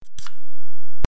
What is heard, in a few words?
Soda Can Pop